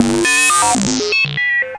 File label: harsh random, Electro FX; UNUSUAL KITS and FX; Atomhard Electro FX